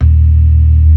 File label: CLASSIC C3, Synth and Organ; InVision Interactive; Keith Emerson; The Most Dangerous Synth and Organ; CLASSIC C3 4